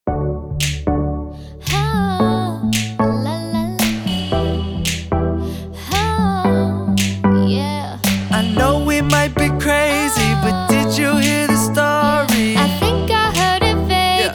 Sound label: jazz